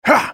spellcast effort; Talon; en US

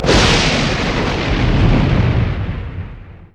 rolling thunder; LoL; volibear

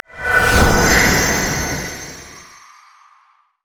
summoner boost; LoL

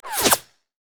basicattack; jinx base

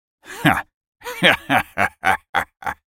laugh; TwistedFate; en US

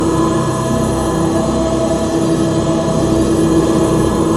nexus powerup heart loop; LoL

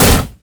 mage chains; LoL; ironforge xerath

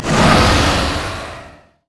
dragons descent; LoL; shyvana

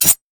jester basic attack; LoL; shaco

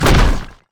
basicattack; LoL; trundle

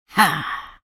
effort; Elise

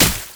manta strike; LoL; fizz

